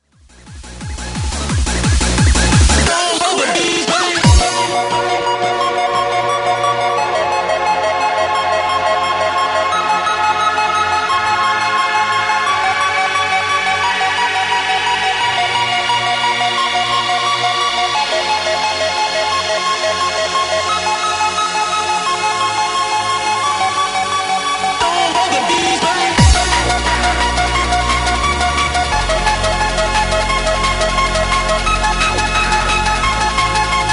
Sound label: hold the beat; cube hard; Electronica Exposed